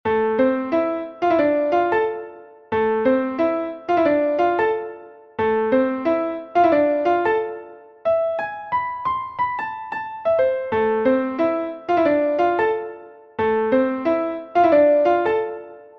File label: swing partie piano